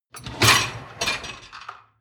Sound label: dishwasher lower tray draw out short; maschinen; spuelmaschine